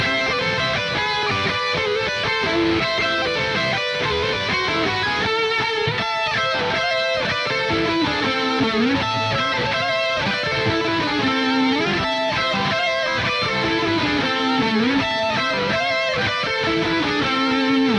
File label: Music Body Guitar; GnomeGnight